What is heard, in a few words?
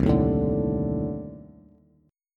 C Minor 6th Add 9th C Bass; Cm6add9 strummed; JGuitar